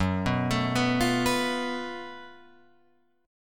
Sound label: Gb Minor Major 7th Double Flat 5th, Guitar, Open F, chord; arpeggiated; 6,6,9,6,8,6; JGuitar